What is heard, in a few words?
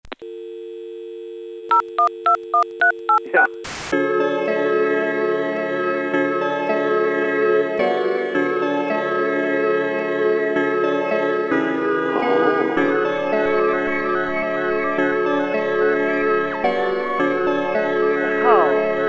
108.5bpm short and serious; Colorado's new 748 area code